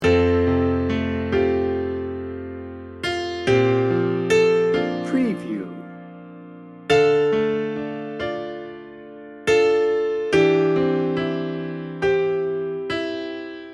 hopeful and dreamy loop

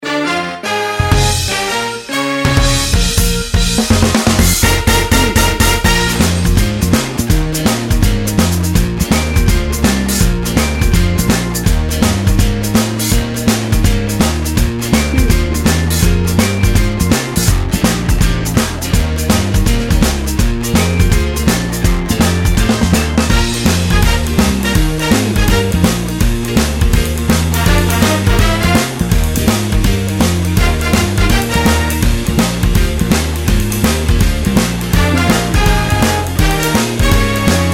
jukebox blues bed

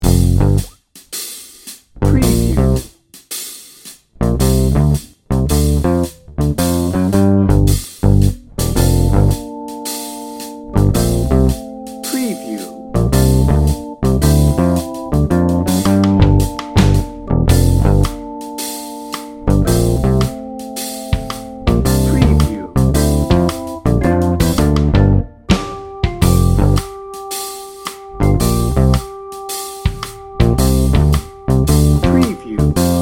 no guitar

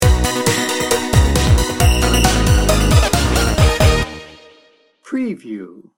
energy bounce sting bumper

